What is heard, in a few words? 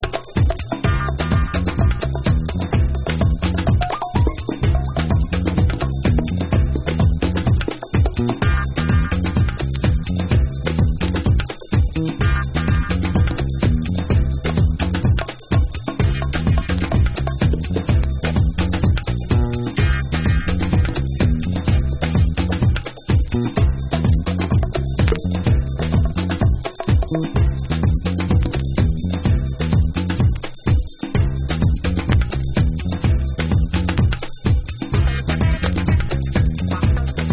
MOOG ROCK Dub